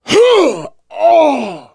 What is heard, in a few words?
hurt critical